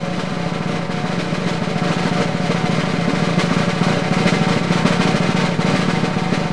SNR ROL, Synclavier Percussion 2 - World & Orchestral; ILIO